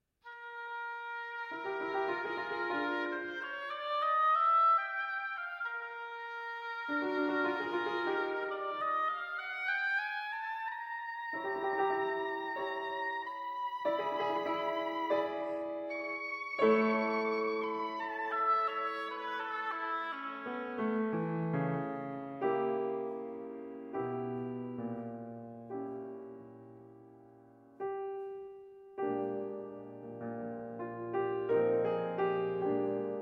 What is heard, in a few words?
Oboe & Piano, Aria; Carmen, WD 31 (Excerpts Arr. for Oboe & Piano); Micaëla Aria